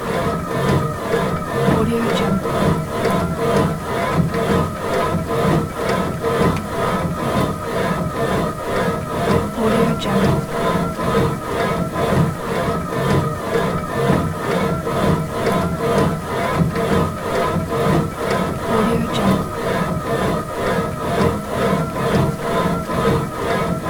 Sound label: elliptical workout loop in gym